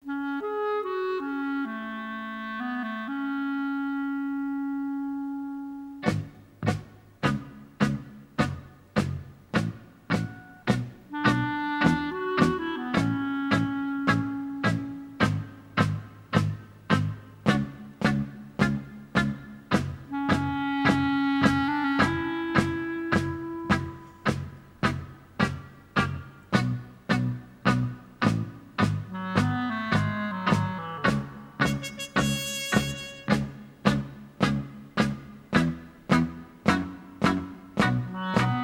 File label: Klarnet; muzyka k filmam